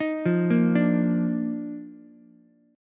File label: D sharp Major 7th Suspended 2nd, Ukulele; arpeggiated; JGuitar